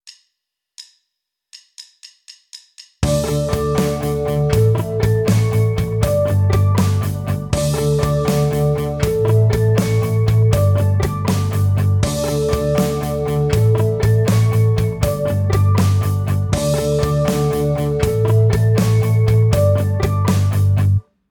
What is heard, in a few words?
Tapped Harmonics, guitar; Rockschool; Guitar Techniques 2019 Issue 290 January